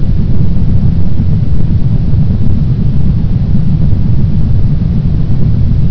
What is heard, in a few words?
big fan; hns bronx